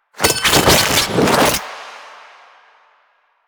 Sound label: Grenade Prep; Warframe; Lasrian; Lasrian Soldiers; Weapons; Lasrian Goonadier Grenade